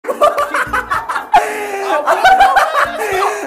laughing

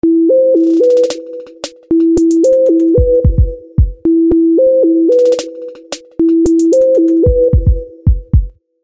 Trap Tone

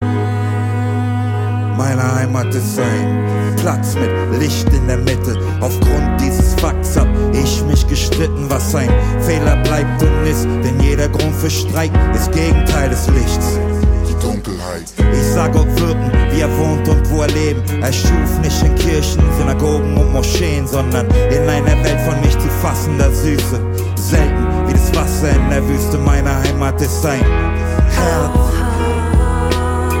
Rap

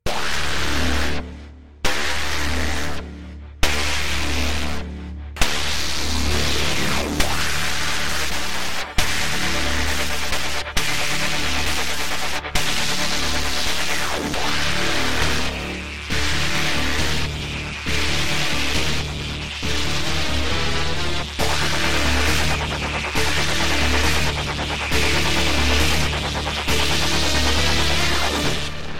Swarm No Drums

